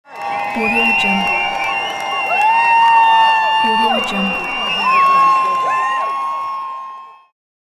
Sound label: concert people screaming cheering; human sounds; جمعیت در حال شادی و جیغ